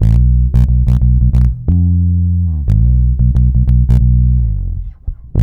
B, bass; Spectrasonics; Bass Legends CD 1; S.BRONX

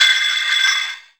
perc, Percussion; Chillout (ambient1&2)

